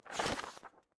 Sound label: Handbook Open